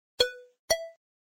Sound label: tick; Fighter Aircraft